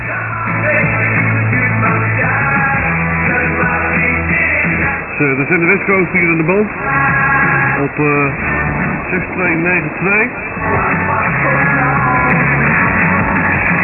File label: 6292 kHz; Westcoast